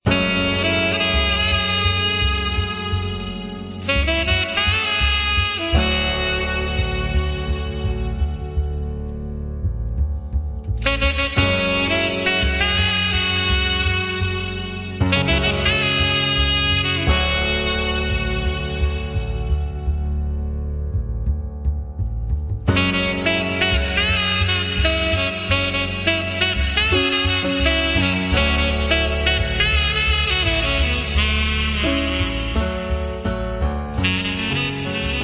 romantic

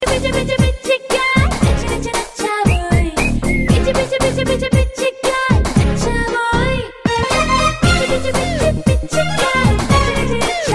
ringtone; magadheera

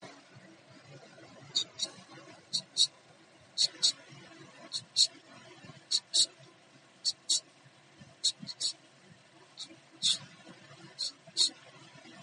dj sound

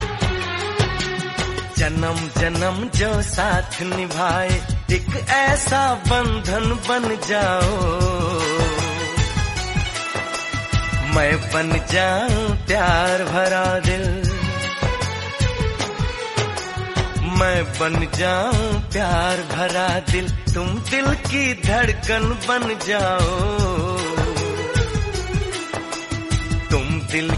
hindi old ringtone

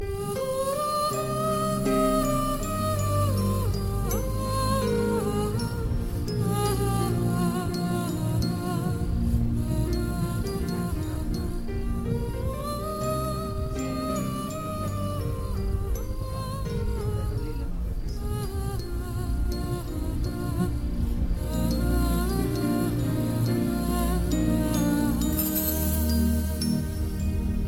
melody

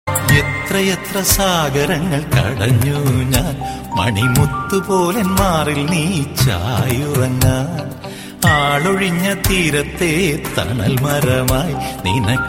ringtone

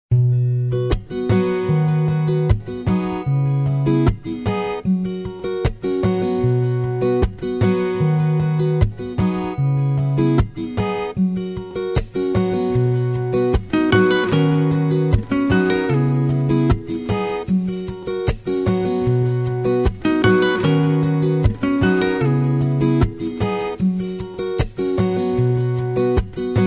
falling intro

